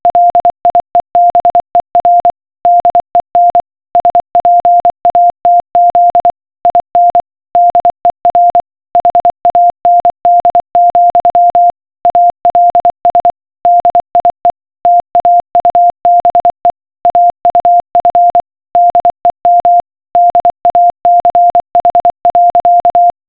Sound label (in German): Morsecode